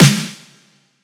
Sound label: snare buzz; Drum One Shots; SD MIDNIGHT Sample Pack; Slate